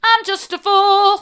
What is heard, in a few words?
mid, vocal